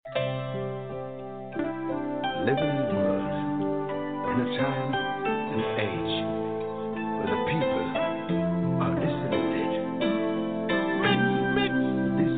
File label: just the sweet reggae music; caribbeanradioshow